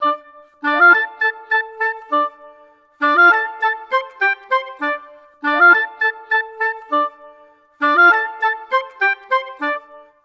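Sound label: 100 D minor, brass; PowerFX; Downtown Orchestra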